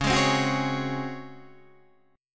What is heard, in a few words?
C sharp Major 7th Suspended 4th, Guitar, Standard; strummed; chord; 9,11,10,11,9,9; JGuitar